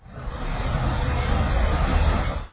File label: truck